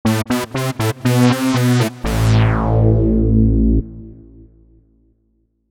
Prisma Bass

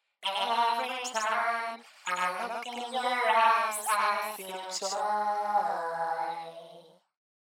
Vocals Distovox

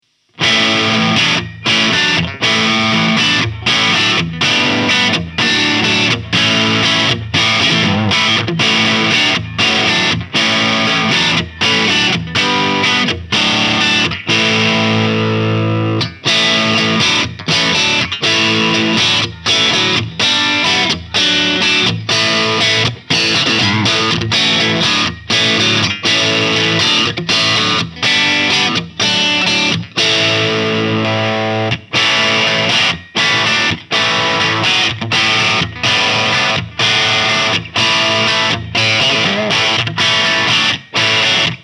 Fender AV II 66 JAZZMASTER RW DKR Crunch

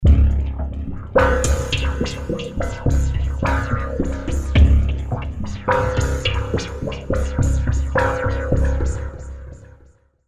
Sweepy Funk; Interpole

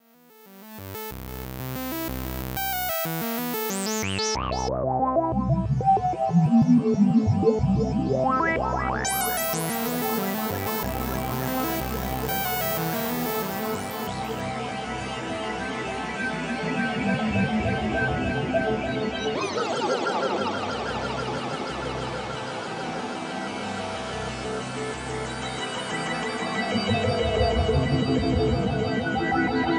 Synthesizer Reverb Experimental Ventris

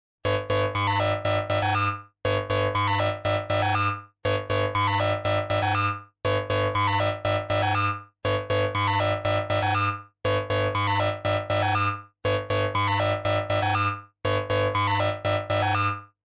Eurodance VCOs; Kobol

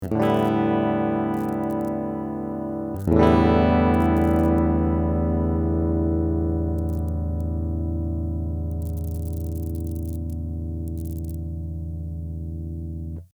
LED Light Noise; Behringer Mic 2200 EQ

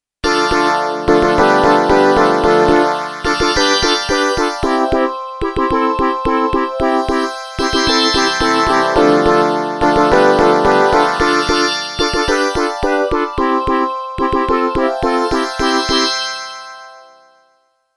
STREICHFETT

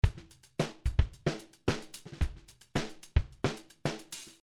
Drums Pitch

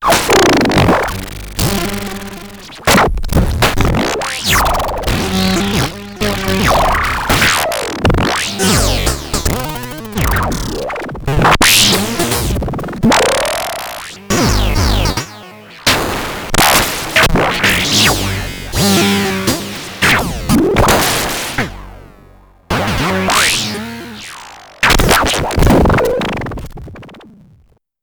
Gotharman Anamono X mini One Voice middle

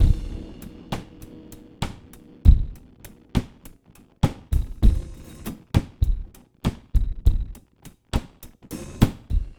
Lofi Samplereduction; Addon

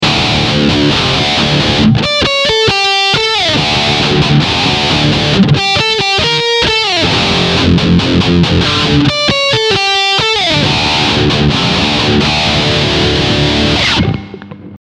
Boss ME-70

